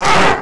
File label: pain, player; midnight guns